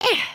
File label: stretch, emotes